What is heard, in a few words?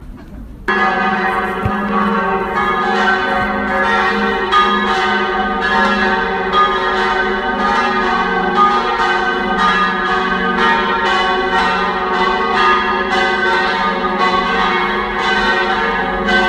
Bell